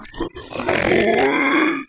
zombie voice idle

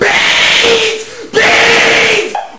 bees

